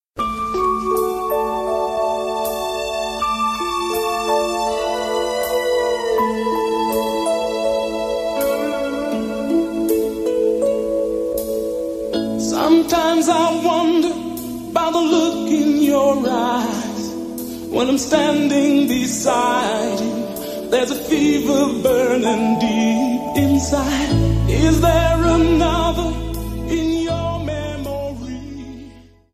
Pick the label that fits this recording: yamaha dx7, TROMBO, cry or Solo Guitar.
yamaha dx7